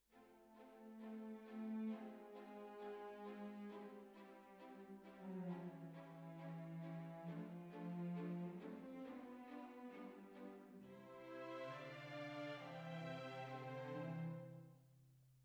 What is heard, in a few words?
Clarinet